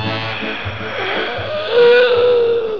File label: gasp